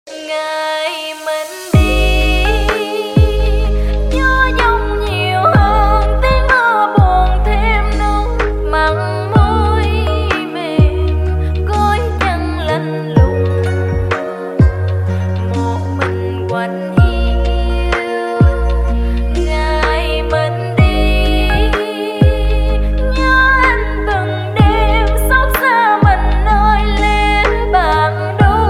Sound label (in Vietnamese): nhạc chuông lofi cover